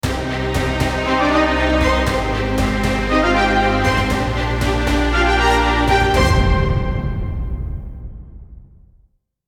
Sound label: orchestra intro